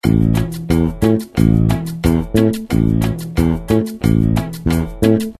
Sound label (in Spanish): Patron bajo cumbia; how to play cumbia bass